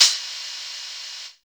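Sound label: SHAKE open hi-hat; Drums In Your FACE!; BONUS HATS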